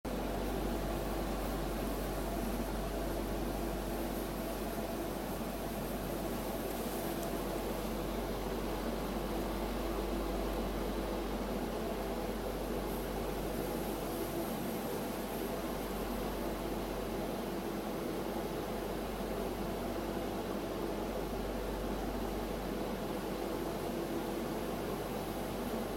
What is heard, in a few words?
disembarking eurotunnel soundscape; uksm